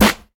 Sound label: short acoustic snare sample G sharp key, snare sample tuned to the G# note; snare drum samples